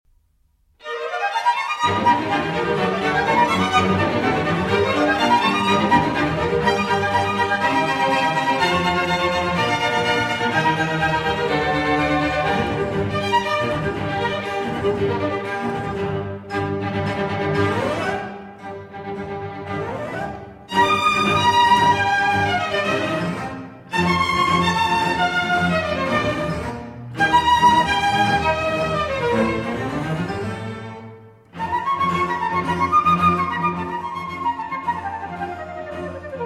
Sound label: Concerto in G Minor for Flute & Strings